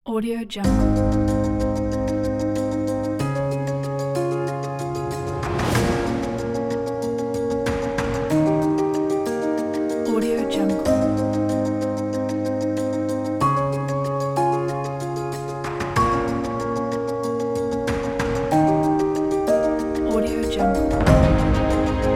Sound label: beautiful emotional